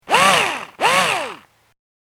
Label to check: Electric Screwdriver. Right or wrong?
Right